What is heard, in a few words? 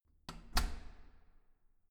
corridor door soft close